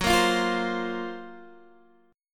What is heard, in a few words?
Gb Major 7th Flat 5th, down, Guitar, DADGAD